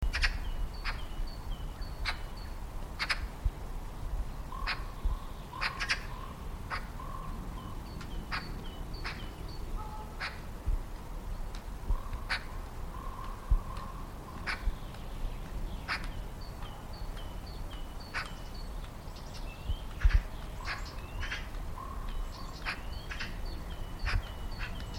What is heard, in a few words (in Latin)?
Pica pica; SETE